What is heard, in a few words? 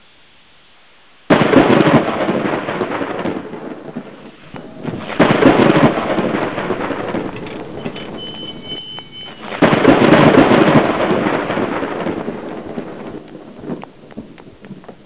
thunder